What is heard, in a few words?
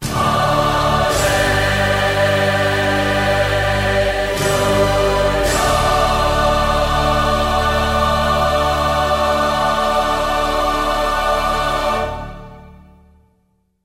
allelujah sung; Voice